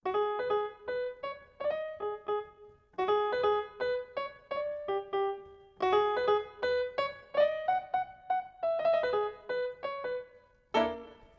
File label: jazz pitch up; pitch shift